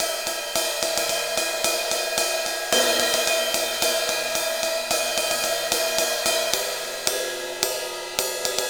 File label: Ride Cymbal Pattern